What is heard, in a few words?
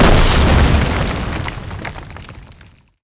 erupt, antlion dig; creatures